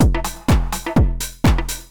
drum loop house 125; eJay

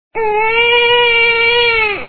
cry; habilidade

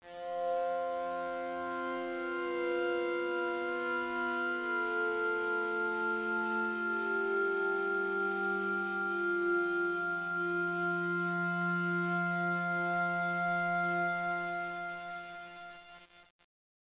PAD

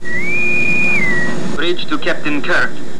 Bosun call; Scientific Logbook

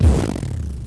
jelly hit; ENGLISH; Far Gate

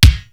dbkick; Techno Maker SPCS

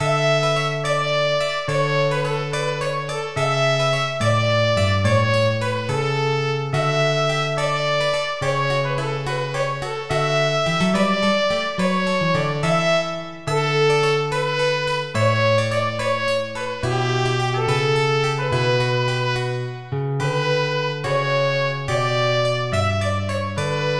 tune

